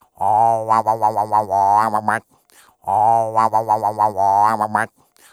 ETHNO EXTRA 90; ethno2

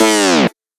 synth shot A; Electro; Anthemic; synth shots

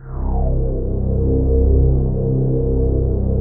PAD; PADS 2

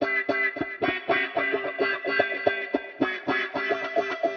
110 A Oldskool Wah, Spaced Out Guitars; Loops; Touch Loops; CosmicFunk

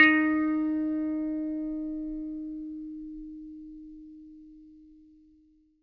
FINGER D#4, Bass; Good 80 Finger; Bass Mace; DirectWave

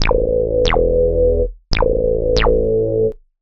ELECTRO BASS 140 A

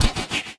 Perc, drums; Touch Loops; BlissedOutBeats

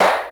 CLAPS; CLAPS+SNIPS

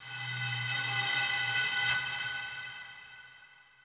bottle, ethno, 125

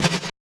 Snare, One Shots, Drums

